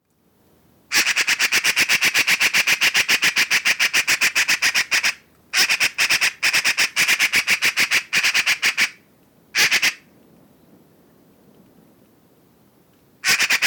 eurasian magpie - pica pica

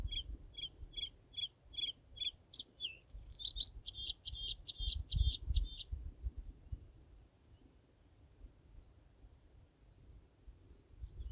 bluethroat - luscinia svecica svecica

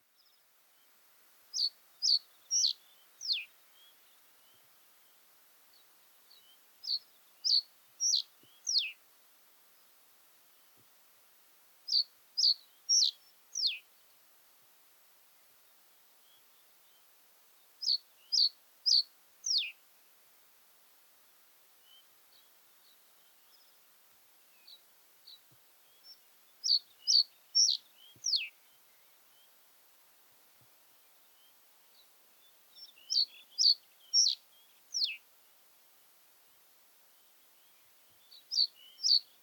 common reed bunting - emberiza schoeniclus

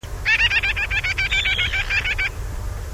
gelochelidon nilotica

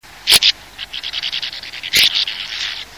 riparia riparia

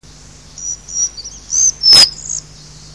ficedula albicollis

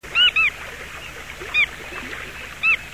limosa lapponica

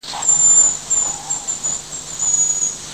bonasa bonasia

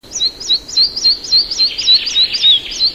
ficedula parva

